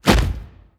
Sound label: bighorn hand appear